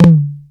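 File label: hi tom flam, drive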